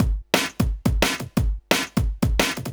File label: 175, Breaks; Drum&Bass; EZ Rollers